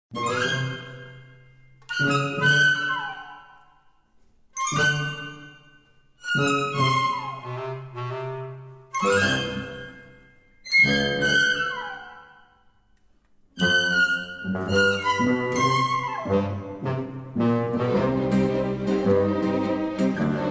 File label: Frisky Flute; the movies 2005